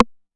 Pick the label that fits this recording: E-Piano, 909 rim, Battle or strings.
909 rim